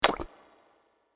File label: Sploosh